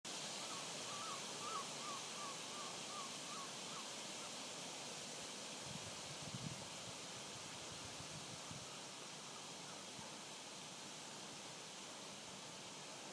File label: wind in trees